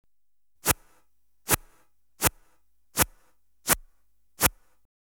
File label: Inverse Gun (6 Single Shots), Electronically Generated Sounds; BBC Sound Effects Library